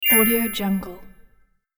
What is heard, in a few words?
gold shimmer ding